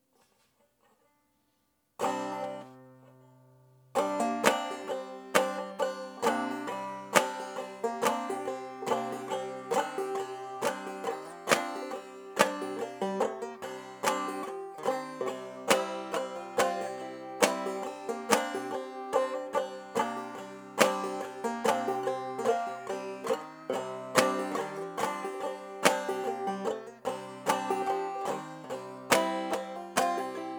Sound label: gospel, banjo